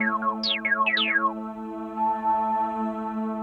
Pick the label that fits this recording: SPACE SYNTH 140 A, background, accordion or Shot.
SPACE SYNTH 140 A